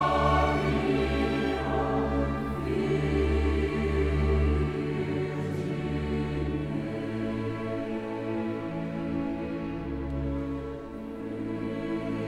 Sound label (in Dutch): Koor gemengd